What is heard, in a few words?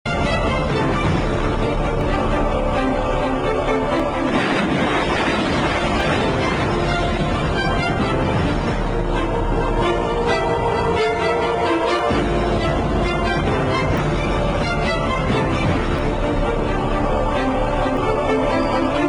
Fight BG Music; dharti ka veer yoddha prithviraj chauhan